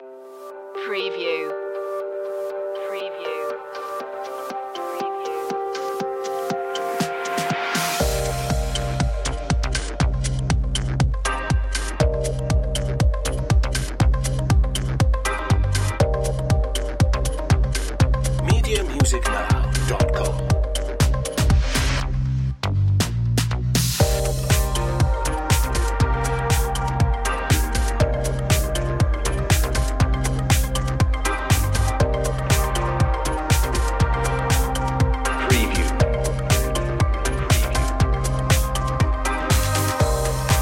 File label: catwalk style, A smooth and stylish track, perfect for fashion, inspiring; chillout grooves